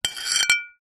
household broken pieces ceramic dish edges scrape

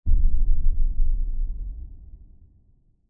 cinematic hit low boom; sound design

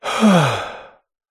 human male exhale sigh relieved relaxed; zapsplat

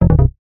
multimedia game tone error retro

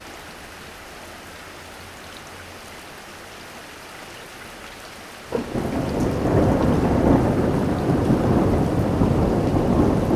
weather thunder rain light; sound effects one

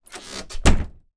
household door wooden slam shut hard internal

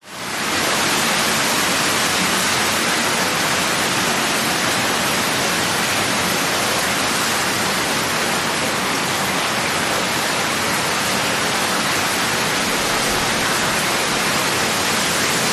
nature rain heavy slight wind

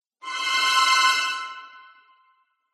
MUSICAL ORCHESTRA Jingle Violins Divisi Sway; smartsound

